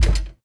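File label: droid bodyfall lg metal; droids robots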